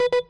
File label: countdown